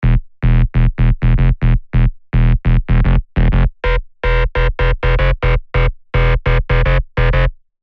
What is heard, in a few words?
BS Hallin Ahh; bass tech house; revealed serum 2 bass tech house vol 1